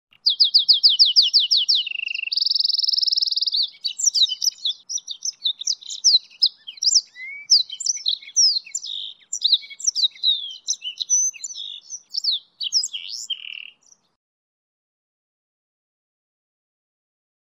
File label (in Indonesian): hibrid goldfinch x kenari